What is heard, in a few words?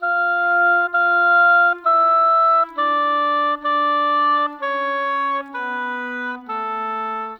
Oboes; Sonic Foundry (Sony Creative Software); Orchestral VOL-2; Modern